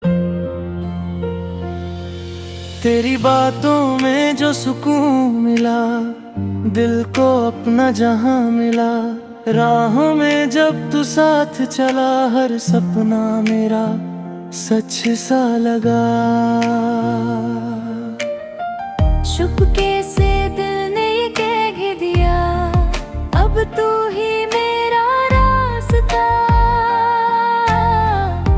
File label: mehndi suroon